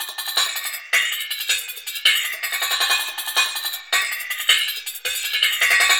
Drum Bass, Drumloops, 160bpm; Zero-G; Total Drum Bass

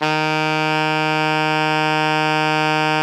ALTO FF D#2, saxophones; Greytsounds; Philip Wolfe David Crozier's Saxophones

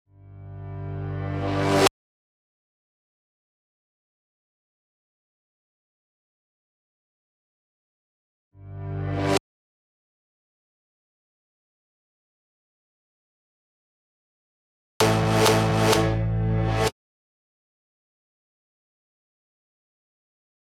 128 BPM Synth reverse; Vengeance - Essential Clubsounds Vol.5; Demo Constr Kits; Slide Kit Root G

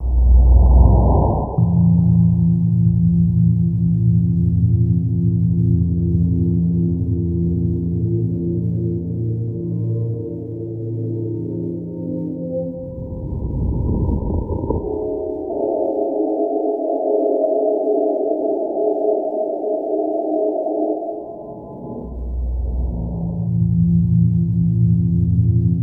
SUB WAVE, Special FX Twisted; USB Soundscan vol.14; AKAI

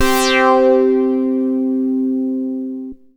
MASS LEAD, Synth

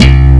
slap bass; jazz jackrabbit 2; M8

